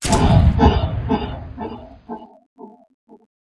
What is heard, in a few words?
monster death